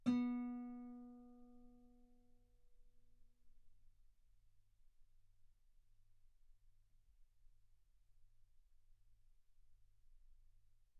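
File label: Guitar ff B string B3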